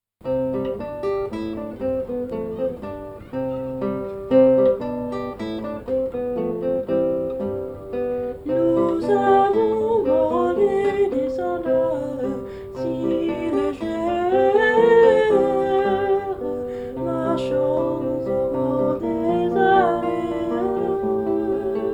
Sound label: les sandales original vocal version